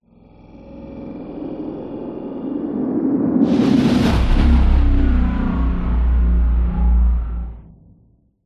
sound design whoosh horror growling into heavy compressed whoosh then resonating; drone; zapsplat